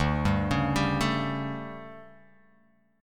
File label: D-Major 7th Suspended 4th, D, Guitar, Open F; arpeggiated